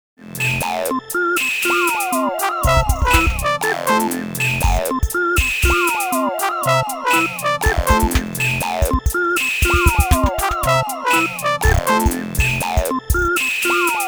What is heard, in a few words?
kaossilator, korg